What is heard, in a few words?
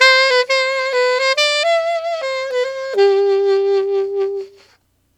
Alto Jazz Waltz in C, Sax; Sonic Foundry (Sony Creative Software)